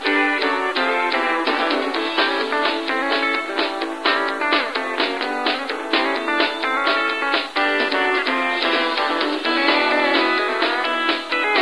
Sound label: country rock am radio loop, music; ambient; Source Engine; cstrike